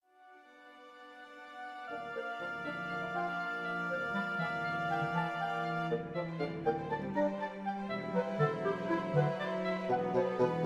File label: studie drei moll ohne kommentar